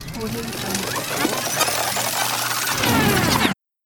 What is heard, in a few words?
tape rewind